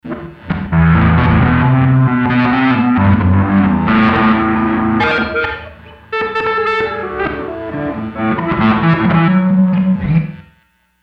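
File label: Reverse Distortion; Sony HR-GP5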